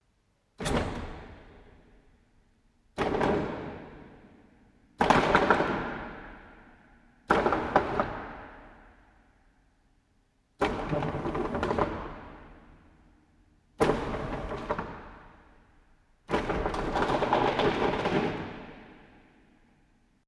Industrial Mechanical Electric Door Large Mid Perspective Short Movements; Metal Door Sound Effects